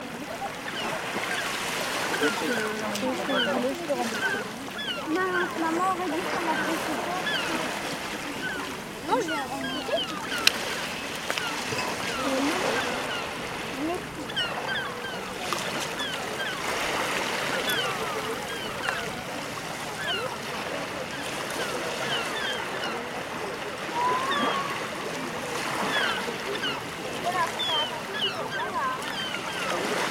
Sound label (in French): calme